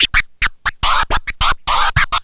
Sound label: Scratching, Vinyl Scratches